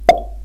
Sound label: Plopp